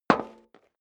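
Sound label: step dress boots metal dry single left, footsteps; schritte; menschen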